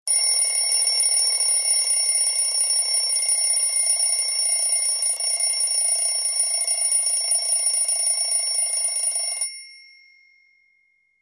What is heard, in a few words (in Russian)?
Звук механического будильника